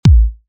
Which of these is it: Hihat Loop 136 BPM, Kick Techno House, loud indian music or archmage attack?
Kick Techno House